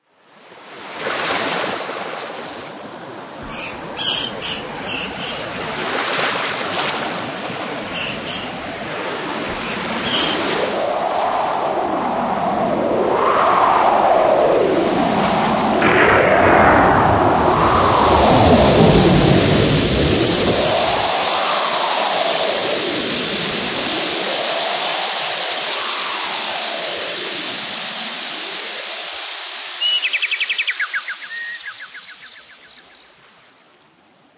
Various nature sounds, wind, thunder, rain, waves, birds in a sound design; Nature Montage; Environmental